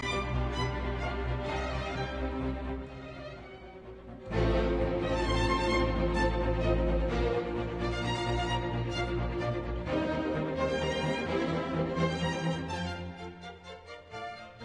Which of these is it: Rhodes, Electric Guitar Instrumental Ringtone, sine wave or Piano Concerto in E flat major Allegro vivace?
Piano Concerto in E flat major Allegro vivace